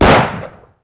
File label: pistol shot; Pirates Of The Caribbean; DUEL